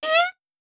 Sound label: new line